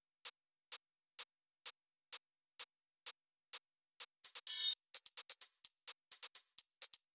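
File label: Drum Loop 128 BPM hi-hat; VEC5 Multi Drum Loop 50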